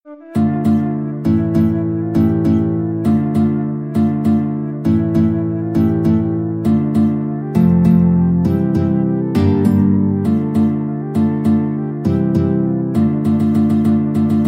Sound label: pirates, flute guitar duet